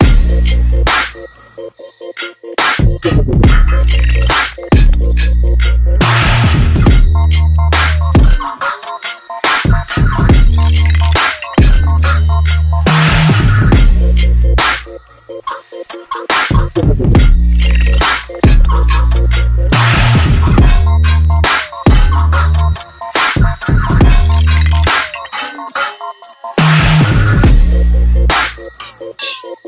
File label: OPPO New Tune